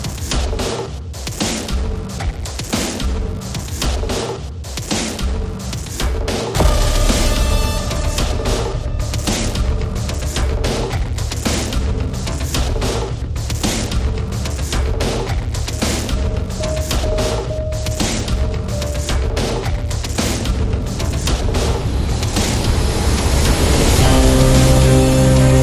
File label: Digital Classical War